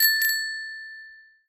bicycle bell; scripted sequences

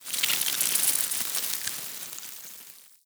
swarm large, cockroaches; critters

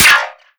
npc charger shield, bullet impacts; Half Life Model Pack

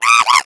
egg bot hot laser; player; Portal 2

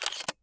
nova insert shell, weapons; Counter Strike Model Pack; CS:GO

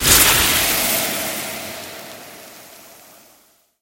poison cloud

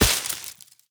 foliage impact bullet; physics

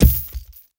weapon heavy impact; physics; weapons

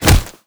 shoulder pound, zombie impacts; creatures

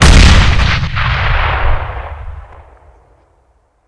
explode, grenade; hegrenade; weapons; Counter Strike Model Pack; CS:GO

